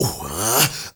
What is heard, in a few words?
MALE SOLO, MALE NOISE, Heart of Africa